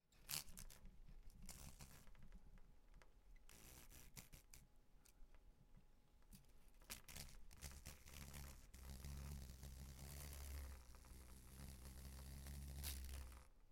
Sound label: hornet wing buzz; shershnya